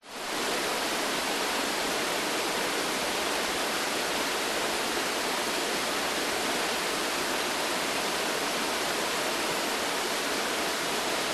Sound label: nature waterfall forest 30m distance; zapsplat